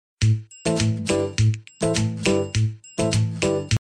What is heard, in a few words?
Music Msg